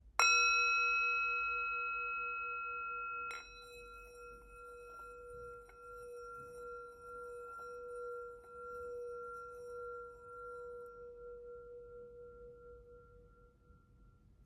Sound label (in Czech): tibetska misa; Tepaná tibetská mísa Wo o hmotnosti 407 g, včetně paličky; Wo malá